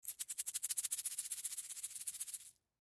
Beads Shake Tube, Beads Shake Fast; Beads Shake Fast Art Craft; Shaking (Sound Effects:Objects); Blastwave; Foley

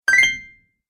Great Idea, cartoon; Cartoon Sound Design Sound Effects

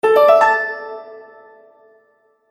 Bright Melody Mobile App; Musical

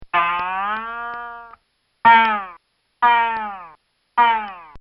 Cartoon Sound Effects String Bong; Cartoon Sound Design

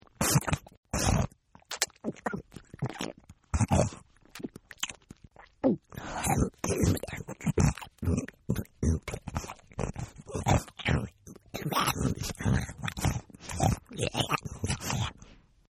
Animal Eating; Animals; Miscellaneous Animal

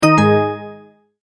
multimedia tone end; McKinney Sound

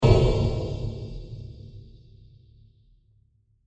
Deep Diffuse Metal Impact; Blasts; Impacts; CraftedSignals

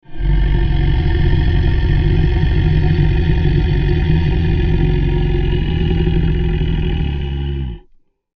Horror Growl Creature Large; Monsters

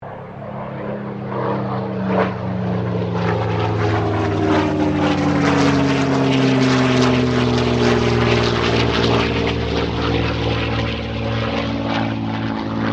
AIRCRAFT SUPERMARINE SPITFIRE MK-VIII PASS; Airplanes; Vehicles

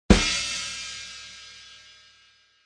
Drum Kit End 110, Funk Drum Ending at 110 bpm; Drum Loop Kit Ending 110bpm; Drum Loops; Funk Drum Filll at 110 bpm

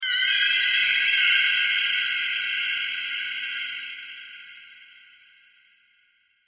Scary high pitched screeching; Ghost Scream; Scary Tone; Scary Tones (Sound Effects:Horror)

